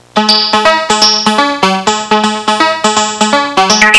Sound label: disco in; Sound Library 2000; Wayzata Technology; Music In